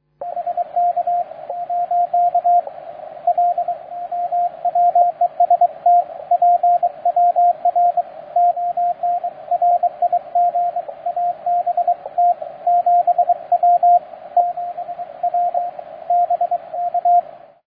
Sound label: 80m, beverage 300°; HAM-radio; FL - 7 Watt; AA7JV